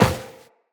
Run Metal, Footsteps; Tenno

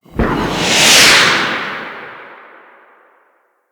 Boost Thruster Warp; Vehicles; Archwing

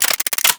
Hand Shotgun Chamber Spin; Warframe; Grineer

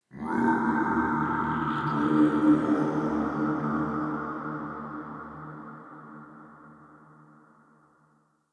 Day Texture, Ambience; Glades Of Andurin

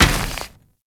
Moa Combined Step Dirt; Warframe; Footsteps

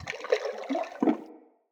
Cavernous Water Lap Rock; Ambience; Under City

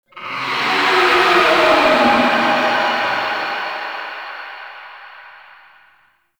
Pain Verb; Warframe; Tau; Sentient Dactolyst

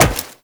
Wall Jump Metal; Footsteps; WallRun; Warframe; Characters; Tenno

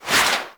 Brawler Rock Punch Swing; Warframe; Warframes

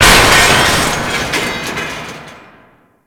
Destructable Vent Cover; Warframe

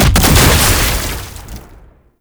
Suicide Scuttler Explosion; Enemies; Gameplay; Boss; Warframe; Oraxia

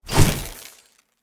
Lightning Grenade Throw; Weapons; Enemy

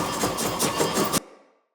Long Sword Throw Perfect Loop; Weapons; Player; Swords; Pothia

